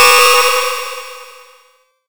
Retro Weird Psych

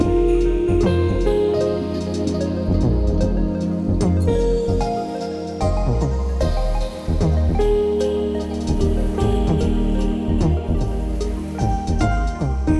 Piano Vibes

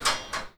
metal interaction

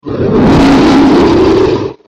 Monster Growl; horror sfx vol 1

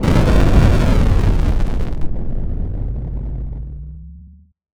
Retro Explosion Long

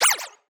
weird, sfx

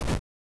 step, 8 bit sound effects

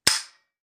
shot

